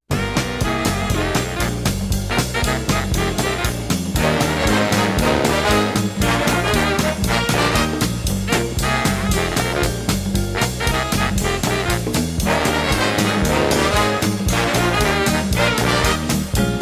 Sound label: Fanfare